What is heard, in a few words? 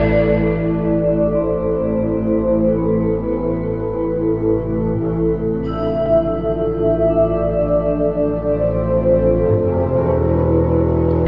keys, Loops, Sad